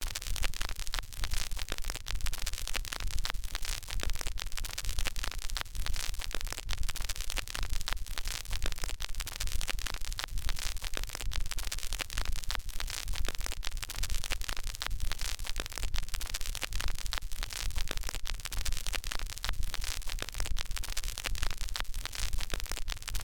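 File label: vinyl record noise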